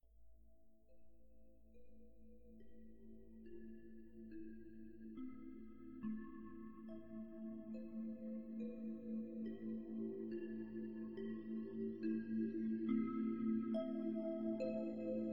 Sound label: steps; PhoenixCraft-1.16.5; ambience music